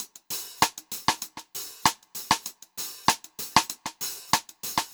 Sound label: Funk Groove Drumloops, funky; USB Soundscan vol.38; AKAI; 90 s Sample CDs